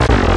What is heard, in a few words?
wrong